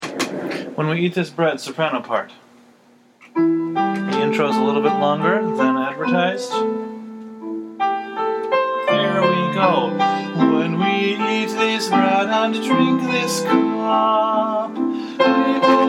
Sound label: when we eat this bread soprano